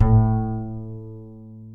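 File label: acoustic bass soft A 2 L; AC.SFT A 2-L; Masterbits CLIMAX 7 - Bass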